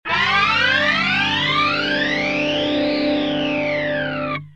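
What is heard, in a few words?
guitar boss bf1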